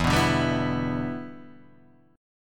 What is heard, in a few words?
E Minor 7th